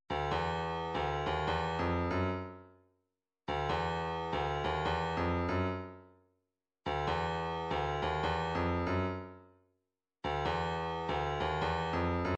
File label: 142 snare